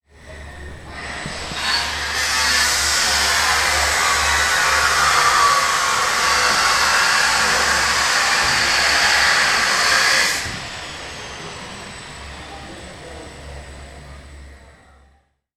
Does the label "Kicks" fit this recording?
No